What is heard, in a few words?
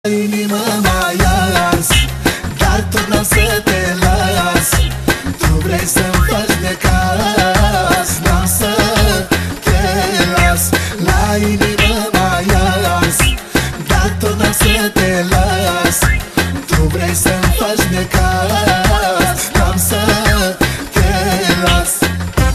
oriental